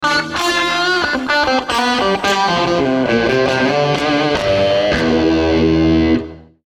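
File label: Musical Element, Drum Snare March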